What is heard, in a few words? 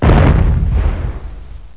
EXPL